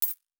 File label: Coin; Fantasy Sounds Bundle